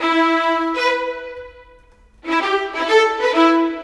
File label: Violins; Sonic Foundry (Sony Creative Software); Orchestral Series VOL-4; Rock and Pop; Rock-Pop 19